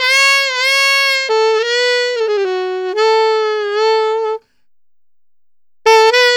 Alto Sax, Alto Loops, Jazz Solos and Sections, Traditional; Sonic Foundry (Sony Creative Software)